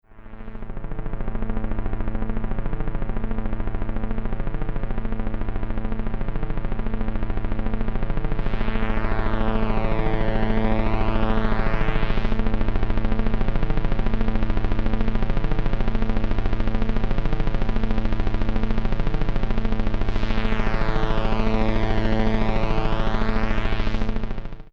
Helicopter SciFi High Tech, Helicopter Interior Futuristic High-Tech Elements; Choppers